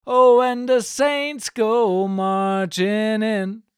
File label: Shure SM58 12cm Vocals